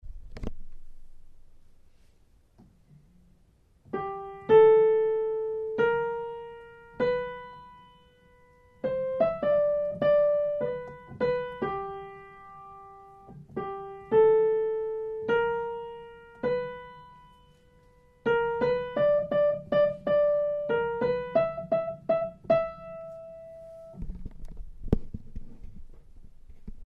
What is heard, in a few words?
Chorus Melody